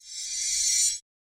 Reverse Magic Shimmer; SoundsCrate

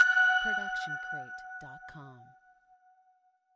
tonal blip; soundscrate

